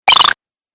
Game Console Arcade Power Up, Video Game Sound Effects